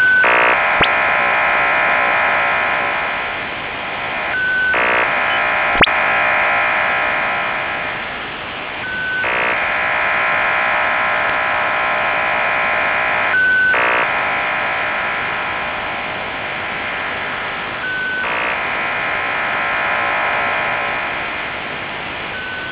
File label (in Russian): nopoznannij ofdm signal skorosti 60 bod raznos 75 gerc